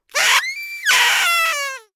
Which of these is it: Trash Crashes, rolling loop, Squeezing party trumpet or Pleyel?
Squeezing party trumpet